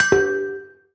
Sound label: Chain, notification; Samsung Galaxy M20